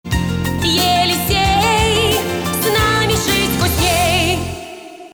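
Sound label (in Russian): фирменный джингл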